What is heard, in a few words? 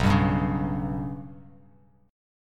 B Suspended 2nd Sharp 5th down Bass Standard; Bsus2#5 strummed; JGuitar